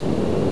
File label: dragging